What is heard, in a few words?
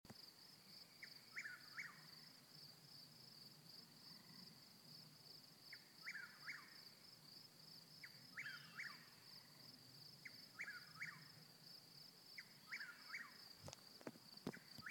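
Chuck-wills-widow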